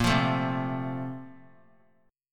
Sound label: Bb Major 7th Suspended 2nd; BbM7sus2 strummed; JGuitar